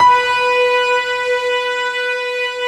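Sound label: piano strings, B4; Best Service; Advanced Media Trax Modern Composer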